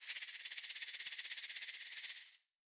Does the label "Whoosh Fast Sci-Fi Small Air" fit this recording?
No